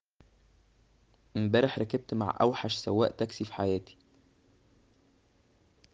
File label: traffic